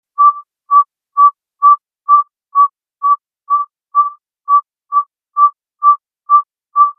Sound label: saw whet